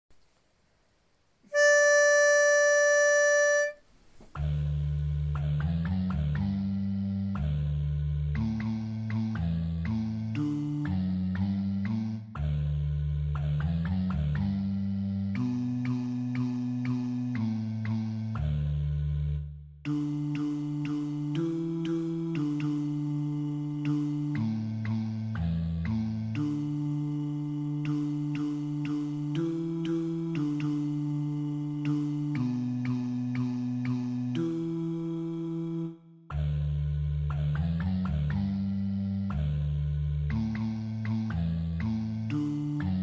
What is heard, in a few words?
Go Tell it Bass